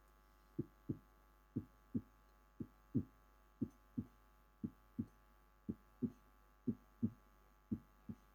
Human sounds Heart sounds heartbeat, vocal imitation